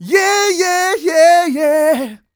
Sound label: 128 uh e-flat, mal, Vocals